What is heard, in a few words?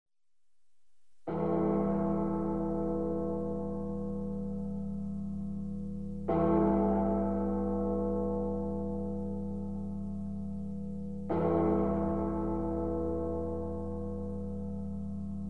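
iphone 4 rock mix ringtone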